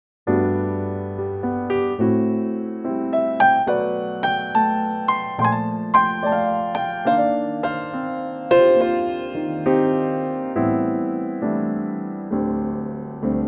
G piano; key - G - vocal range - B to E; Wonderful piano only arrangement

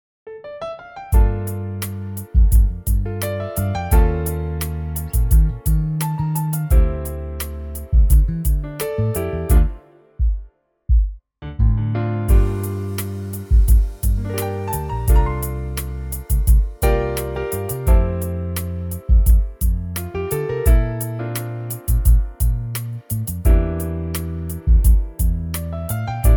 Smooth

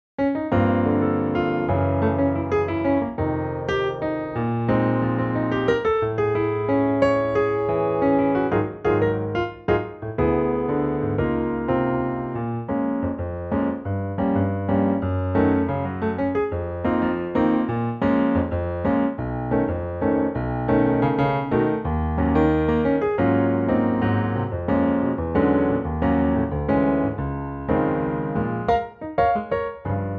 Db latin Piano; key - Db - vocal range - A to Db; 2024 Latin piano arrangement; Pianotrak

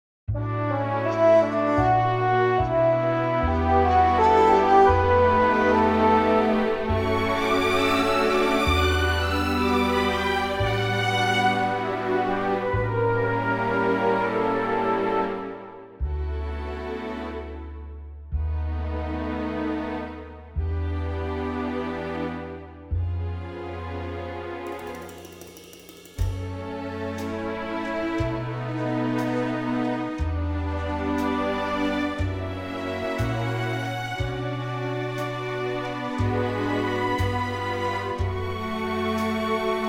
D orchestral; key - D - vocal range - B to E

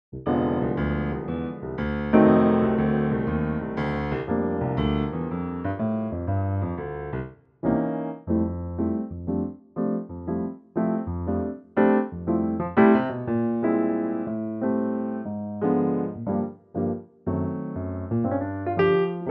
F piano; key - F - vocal range - C to C

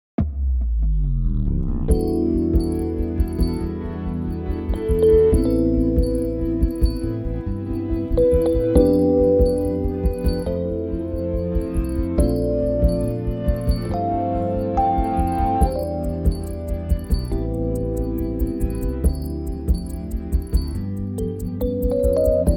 C smooth; key - C - vocal range - Ab to C; superb smooth jazz arrangement; Gorgeous electric piano

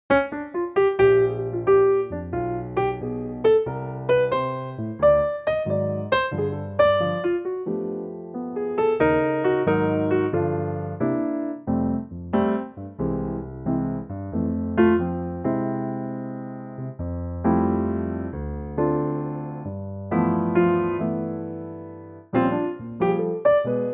F piano

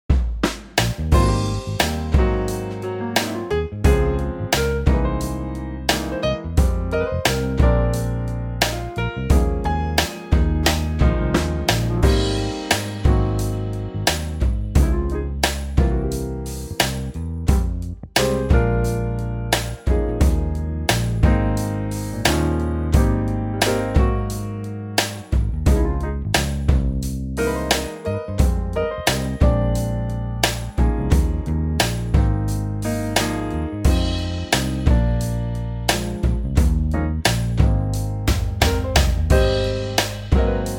Ab Trio; vocal range - Eb to Ab (optional Bb); Superbly soulful; punchy kit, funky basslines

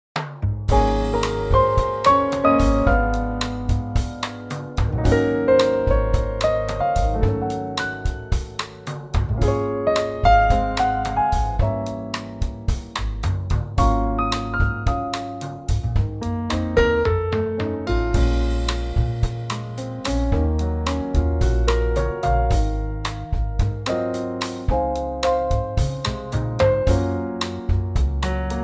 Db bossa

